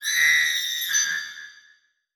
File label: Production Element Title Transition Crystal Element